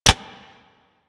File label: Throwing Dagger Idle Catch; Warframe; Weapons